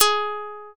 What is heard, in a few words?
shamisen